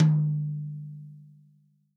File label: TOM, Real Drum Kit, POP KIT